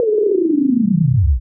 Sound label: Game Over